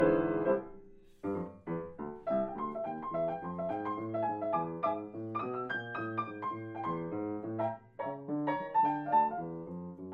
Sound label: + saxofon